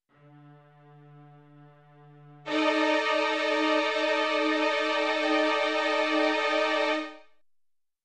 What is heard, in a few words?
Horror movie suspense sound effect